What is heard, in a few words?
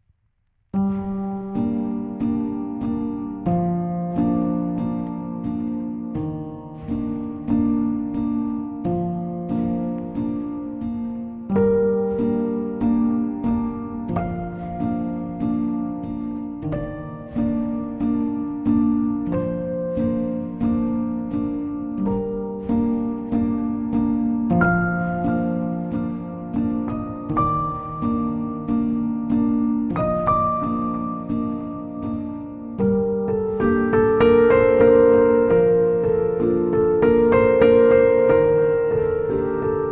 Solo Piano Version